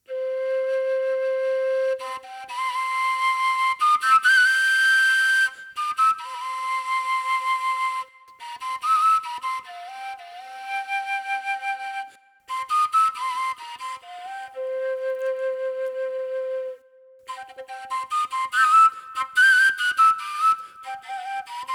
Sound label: Overtone C